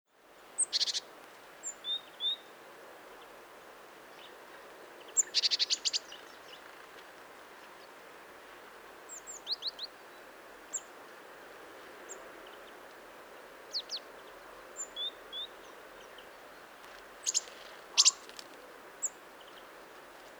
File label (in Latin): Parus major; SETE